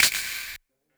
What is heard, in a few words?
Clap Snap Hard Verb; Lost Tapes From Mars; Clap & Rim